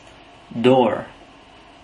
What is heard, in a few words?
door